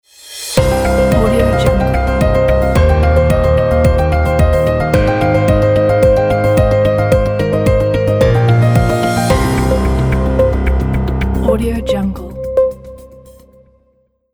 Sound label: breaking news intro logo